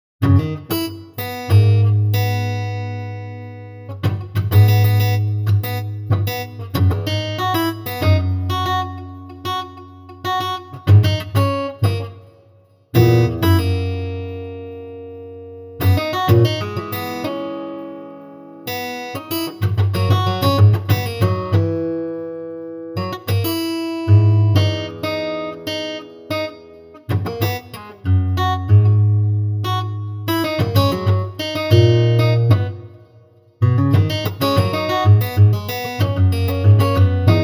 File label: Ample Guitar Record